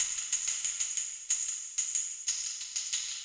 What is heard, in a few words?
tamb